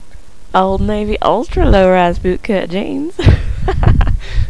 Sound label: hick